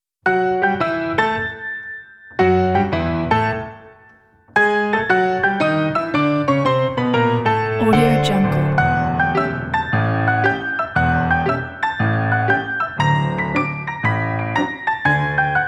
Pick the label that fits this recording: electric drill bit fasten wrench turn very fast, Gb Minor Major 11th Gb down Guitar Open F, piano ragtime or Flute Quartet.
piano ragtime